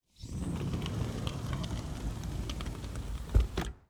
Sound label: Sliding door, track, heavy, glass, metal, dragging, course, gritty, opening, front, med speed; Eiravaein Works; Vaeyan IV